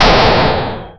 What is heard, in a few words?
space explosion arcade; space explosion arcade th